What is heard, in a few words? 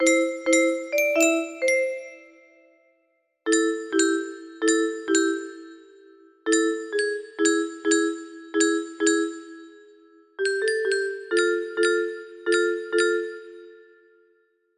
melody